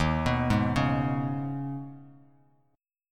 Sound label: D sharp 7th Suspended 4th Sharp 5th D sharp, Bass Standard; arpeggiated; 11,14,6,6; D#7sus4#5; JGuitar